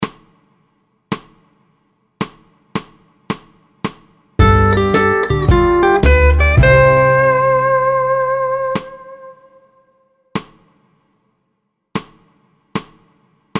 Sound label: Key C Vamp 4 4